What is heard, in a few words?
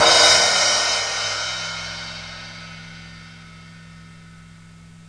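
cymbals, drums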